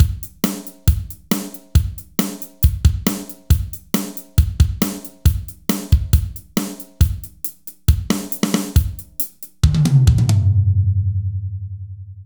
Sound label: Drums